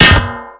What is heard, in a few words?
knockdown; Rust